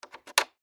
Door Hinge Pin In, Foley, Door, Hinge, Pin, Insert; Miscellaneous Doors; BlastWave